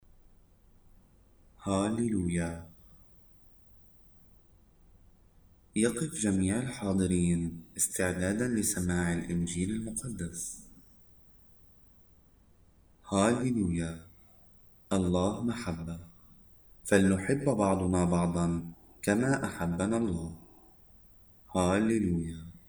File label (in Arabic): هللويا